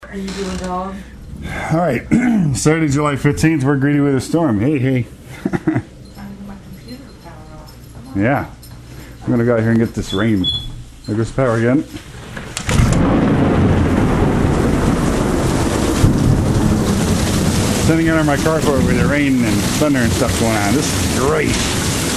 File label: thunder storm